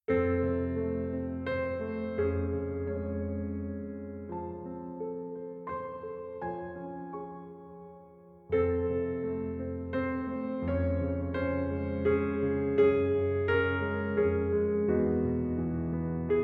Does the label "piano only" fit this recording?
Yes